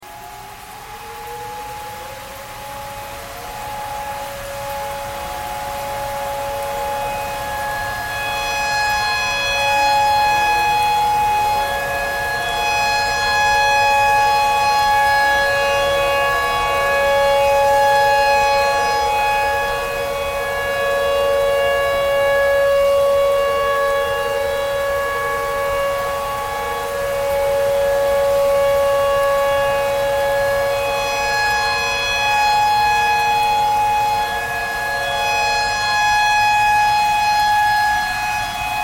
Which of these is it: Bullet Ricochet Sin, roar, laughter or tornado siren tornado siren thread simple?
tornado siren tornado siren thread simple